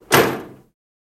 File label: Buick 65 Trunk Close M, trunks & hoods